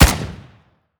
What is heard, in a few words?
pp2000 fire; STALKER